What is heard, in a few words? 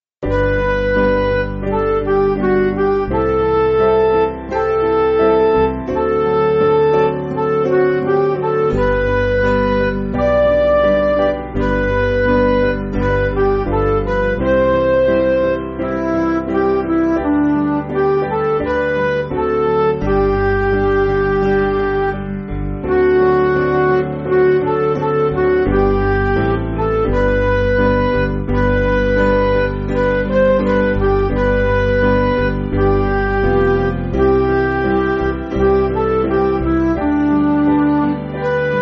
Band Midi